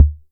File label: cmos quick, Kickbank; Ableton Foundation FreePacks